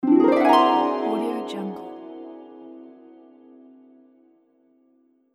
mysterious magic harp spell

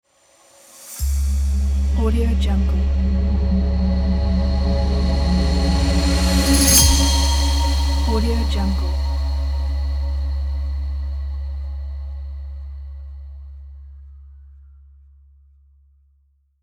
deep logo reveal

